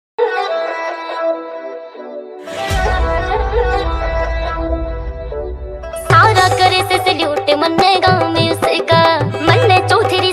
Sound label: Dj Song Dance Mix Haryanvi Song Tapori Style Mix